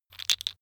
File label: tiles wood; objekte; holz